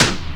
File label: hit, percussion hit; Ueberschall; Techno Trance Essentials; DRUMS